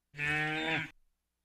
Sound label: Cow moo; Technology